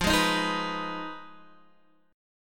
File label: F Minor 7th Flat 5th, Guitar, Open D